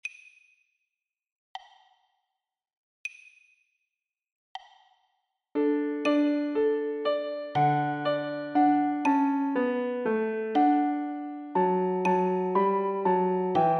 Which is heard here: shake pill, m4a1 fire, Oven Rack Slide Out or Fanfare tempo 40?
Fanfare tempo 40